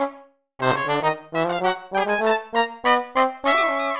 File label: latino, brass; arturia